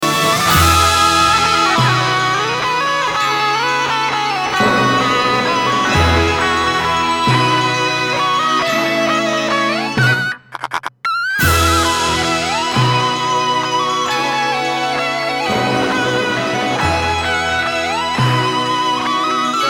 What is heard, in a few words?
Intro Waltz